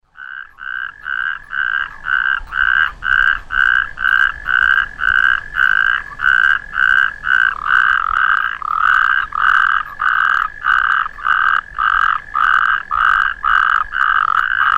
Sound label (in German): Kreuzkroeten